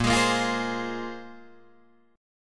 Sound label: A sharp 7th Suspended 2nd down Guitar Drop D